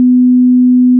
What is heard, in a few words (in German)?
ton